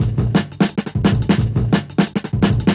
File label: DNB BREAK; m8 breaks